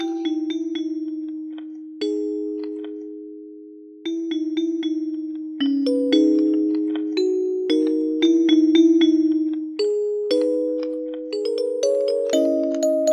Merfolk Music Box; Poupi's incredible adventures !

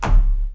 jump

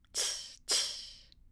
UI Sounds Tsss

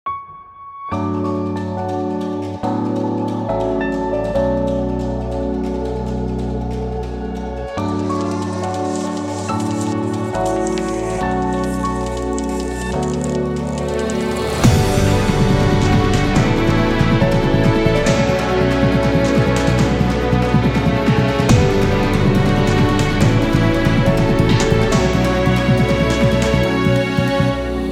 Inspiring Melody Emotional Strings; Astria Blinds

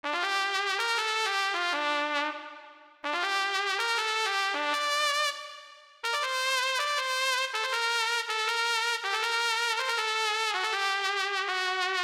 untitled trumpet

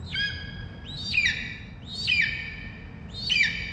ability conjure eagle loop

